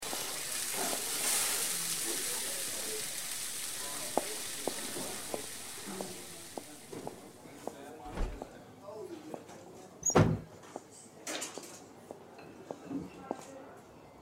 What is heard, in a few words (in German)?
diner hintergrund; life is strange